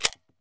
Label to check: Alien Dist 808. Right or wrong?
Wrong